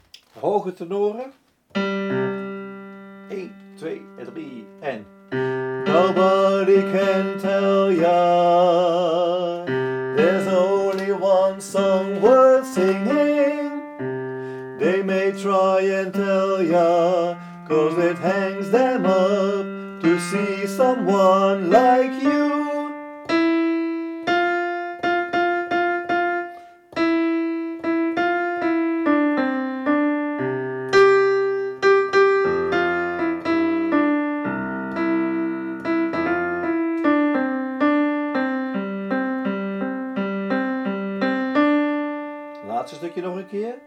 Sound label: tenor; oefenfiles tenoren 1